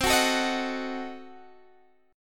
C Diminished 7th, Guitar Standard, chord; Cdim7; strummed; 20,21,19,20,19,x; down; capo=9

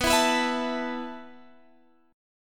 E Suspended 4th B

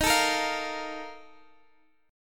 A sharp Major 7th Suspended 4th

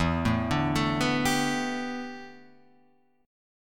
A 7th Suspended 2nd Suspended 4th

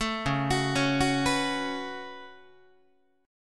C sharp 7th Suspended 4th Sharp 5th, Guitar; C#7sus4#5; arpeggiated; JGuitar

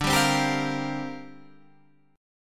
C 7th Suspended 2nd Suspended 4th D

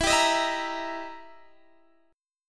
E Minor Major 7th Flat 5th Guitar Drop D; chord shape

